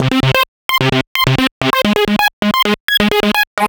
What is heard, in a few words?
8-Bit Arp, Underground UK House & Electro, Synths; Sonic Foundry (Sony Creative Software)